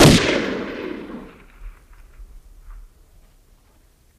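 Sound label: Gun Shot Single Shot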